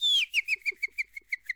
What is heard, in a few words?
WildFX, African; Quazibeat; African Secret; SFX